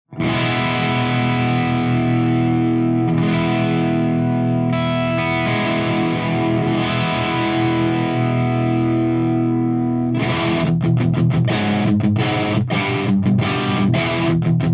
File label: ENGL BLEND